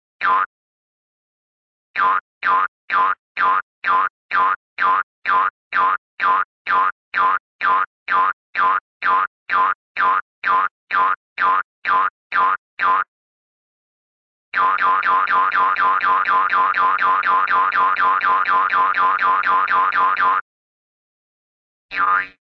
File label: hop, cartoon single jews harp hop